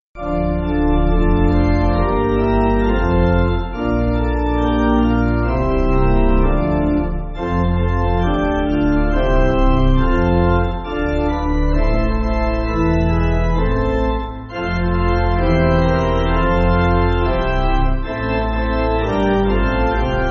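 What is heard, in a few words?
Pipe Organ, Backing Files for Churches; Have Mercy On Me O God; Debrecen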